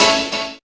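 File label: piano chord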